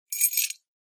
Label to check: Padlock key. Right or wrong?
Right